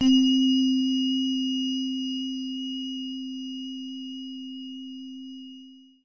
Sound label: celeste long; Orchestral